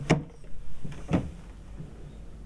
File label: door open; ドア開ける; ドア開けた！(立体)